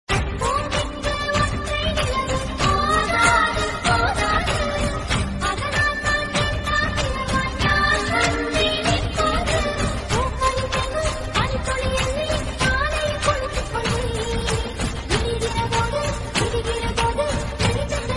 Tamil Ringtone

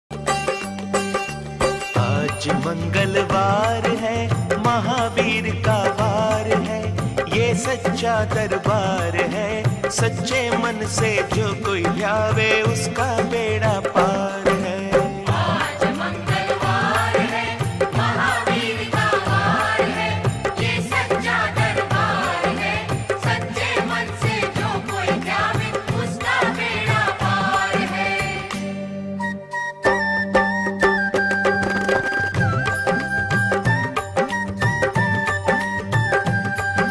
Hanuman Ringtone

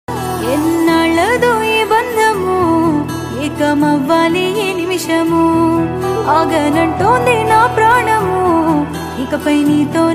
Telugu Ringtone

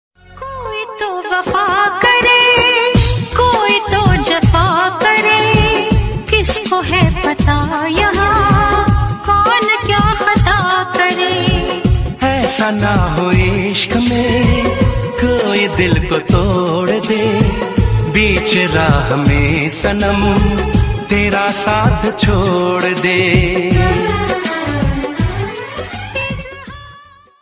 Sad Song

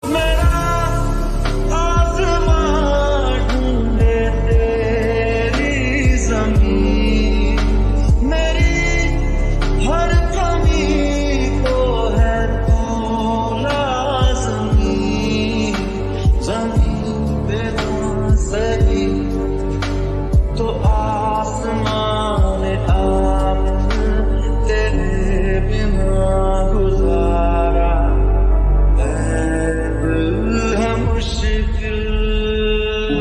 Sad Song Ringtone

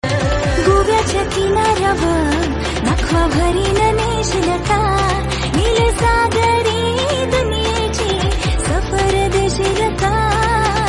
Marathi Song

